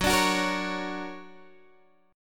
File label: D Augmented Major 7th F sharp, guitar